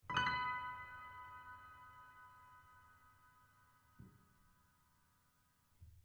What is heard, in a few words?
Accord 2 Ped, Pianoteq, piano